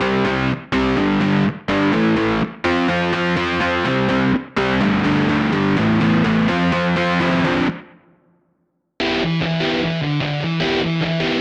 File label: Rock Metal